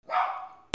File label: DOGS